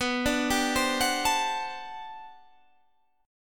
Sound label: G Major 11th B